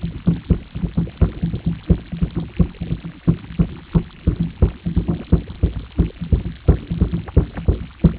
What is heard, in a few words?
percussion